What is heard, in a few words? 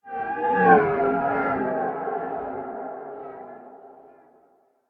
Soul Passing Whoosh